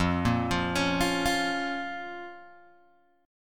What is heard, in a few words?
F Suspended 4th Sharp 5th F, Guitar Open G